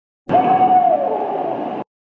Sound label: BARRED 1 NOTE